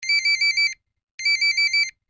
Vintage Ring; Vivo X27 Pro; ringtones

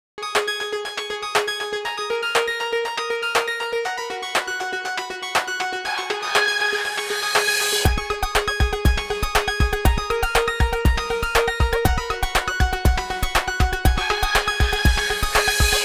Space Bird, ringtones; Motorola; Moto G20

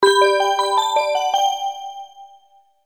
Text and Multimedia

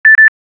Beep-beep, ringtones; Samsung; Galaxy Express 2 SM-G3815

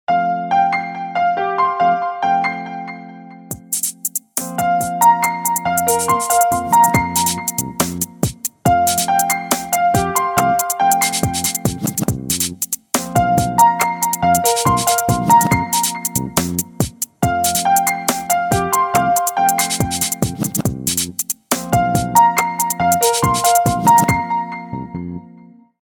Piano, ringtones; Hisense; A5

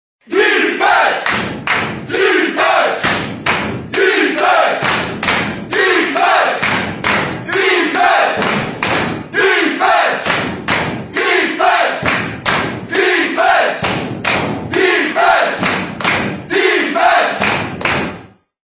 Sports Defense, Windows Mobile ringtones; Microsoft

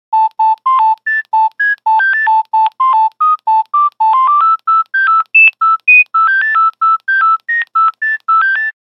Nokia Buzzer; Windows Phone apps

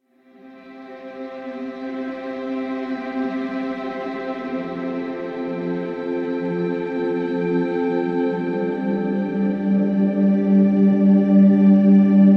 weather alarm cloud; OnePlus; ui; OnePlus 11

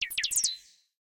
Cheeper, notifications; Samsung; GT-I8250

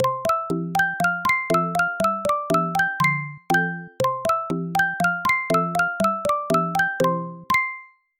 Balloon Bells, ringtone; Samsung Galaxy Z Fold 7; Sound Theme; Fun

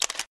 Shutter Sound; GD510 Pop; LG; System sounds

